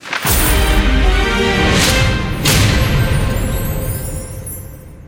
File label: large win; hiddencoinsofegypt